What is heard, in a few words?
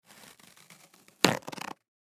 Paper Towel Rip Tear Sheet Off; Paper (Sound Effects:Materials); Foley; Blastwave